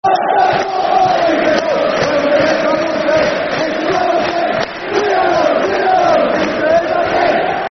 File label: Eieieio, Football Chant (Soccer Song); Eieieio Doncaster; Doncaster Rovers Drfc